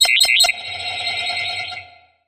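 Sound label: Danger Sci Fi Button Press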